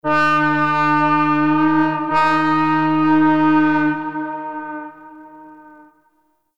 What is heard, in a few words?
Town Horn Alarm; Ambience; Gameplay; Duviri